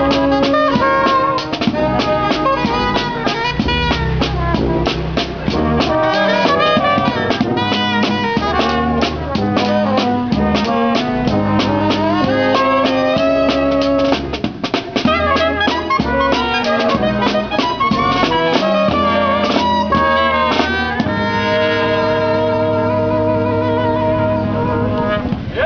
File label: band